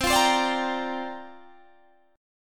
C 9th Suspended 4th C down Guitar Open D

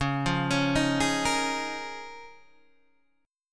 Db 6th Add 9th Db down Guitar DADGAD

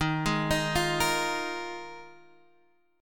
Eb Suspended 2nd Suspended 4th, Guitar, Drop D

